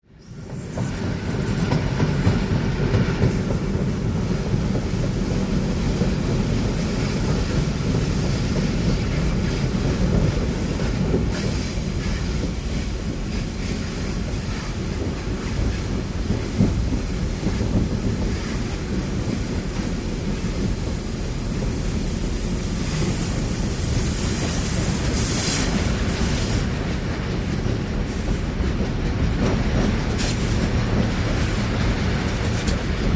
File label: Train Run Steady Int, diesel